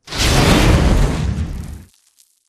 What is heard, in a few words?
atlas dash close; Titanfall 2